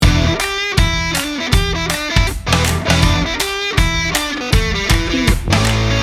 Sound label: beer party loop